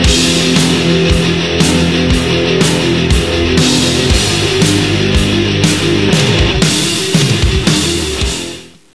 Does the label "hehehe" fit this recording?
No